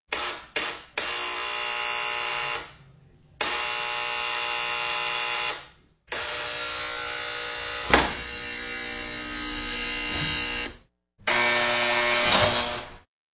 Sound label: Beeps; 헐리웃특수효과음; 신호-경적